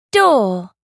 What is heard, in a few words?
door